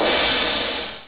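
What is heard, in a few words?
CRASH CYMBAL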